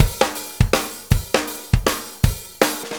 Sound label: Shuffle Fill; Drum Tools